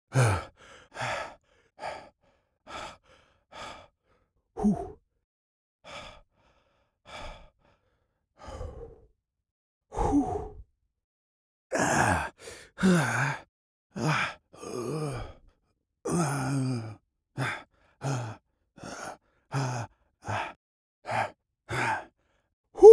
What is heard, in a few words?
Old Man Or Middle Aged Man S Struggled Breathing